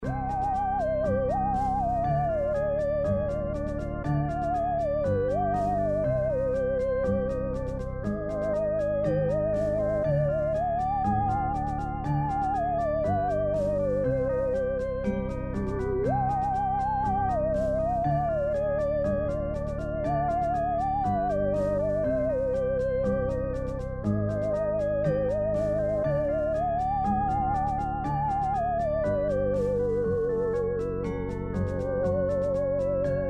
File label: Halloween tension loop